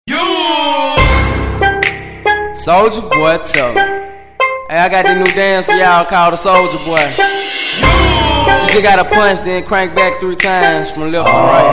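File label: music for a cool moment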